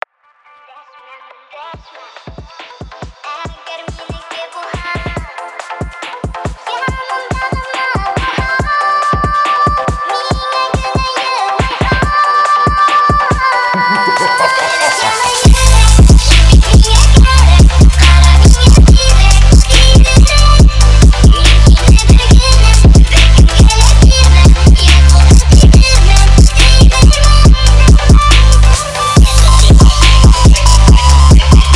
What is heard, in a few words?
phonk